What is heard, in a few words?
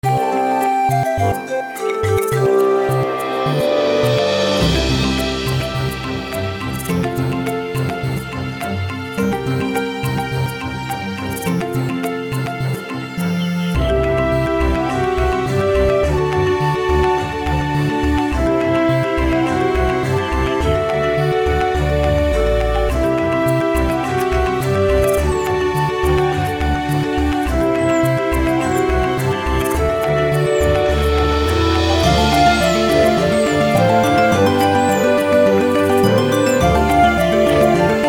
chiptune orchestral; gleaming forest